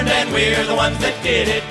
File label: music; wu xing; earth